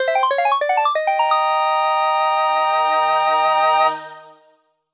Item Jingle

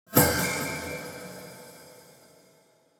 HiHat Scared

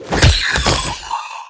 Enemy Poult Dead

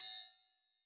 popup

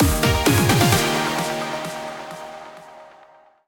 Tier Up; Pokémon Trading Card Game Live

